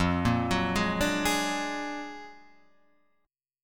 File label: Gb Augmented Major 9th, Guitar